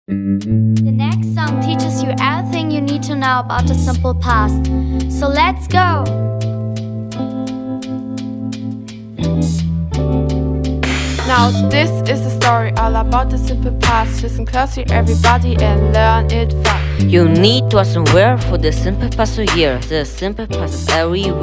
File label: English Rap